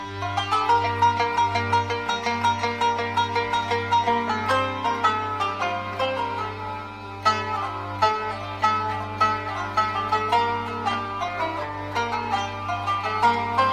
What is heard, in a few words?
qanun taqsim; bayati